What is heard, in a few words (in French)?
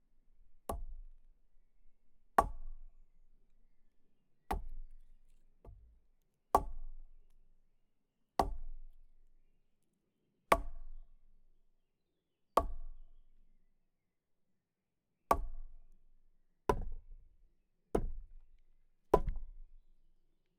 Baton sur buche qui sonne bien serie, Percu Bois